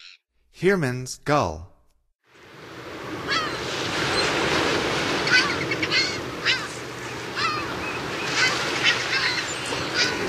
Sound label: Heermanns Gull, Songs of Birds of North America; Stokes